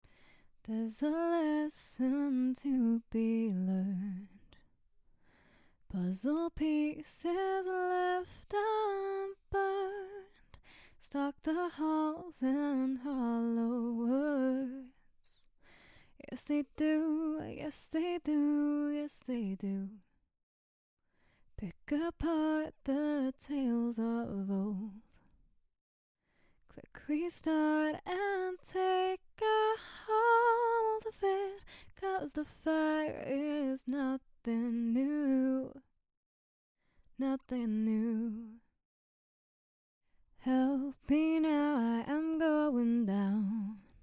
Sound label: pop 92 Gm, acapella